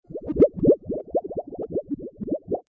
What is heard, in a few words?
Under Water Sound; FXHome